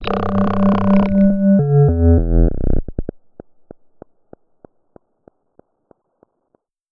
zoom activation strange

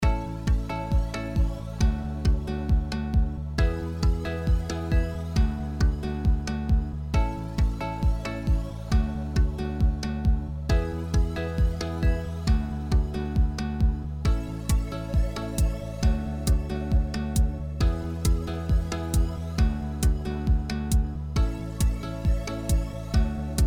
lobby; ThreeHeadedDragon

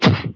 collect; hello neighbor

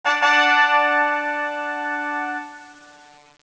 gift Reward

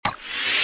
button Auto Spin On; slots; ui

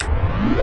lobby enter game; EGT

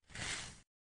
sound Fold

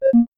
hangup call

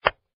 step

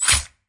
boomerang slice

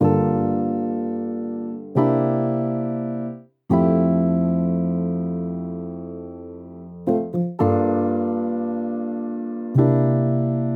CHORD

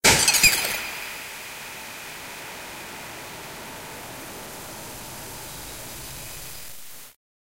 connect strike

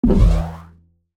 Shield Activate

